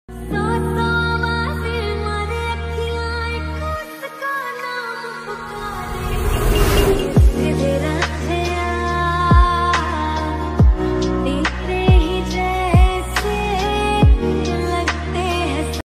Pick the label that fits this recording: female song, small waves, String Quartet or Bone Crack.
female song